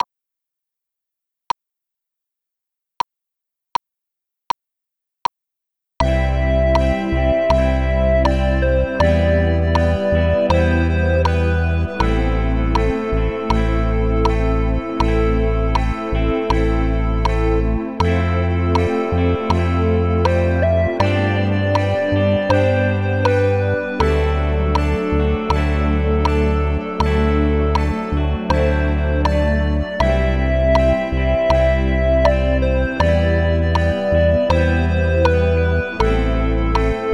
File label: Candlelight Keys